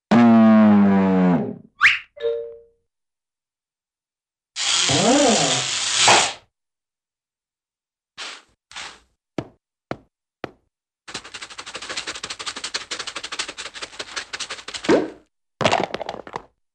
Bore Into Ground & Drop Object Into Hole, Cartoon; Hollywood Edge; Cartoon Trax

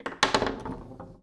Foosball Ball Drop

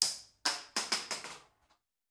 Bullet Shell; Sound Ideas; Guns

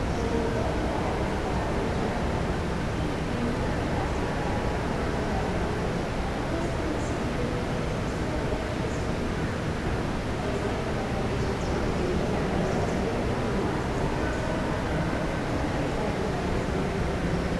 Urban train station babble indistinct; urban and suburban; boom library

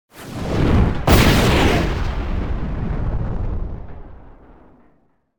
Bomb Medium Explosion; Hollywood Edge; sound designer's toolkit v2

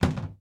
DOOR Cupboard Wood Close; mono; Universal Sound FX; DOORS & GATES

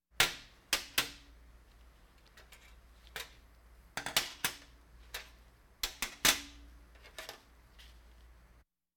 Hospital effects

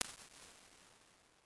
19x24 ft Studio Instrument, impulse responses

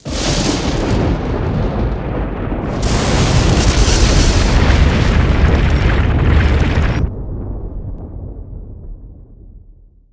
Quake Lightning Earth Crack; Destruction

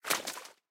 Puddle; Blind Quest - The Frost Demon

